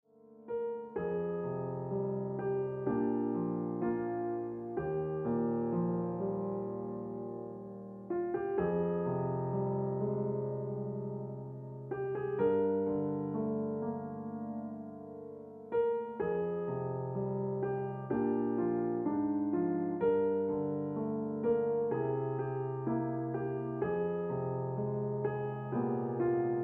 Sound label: Piano Music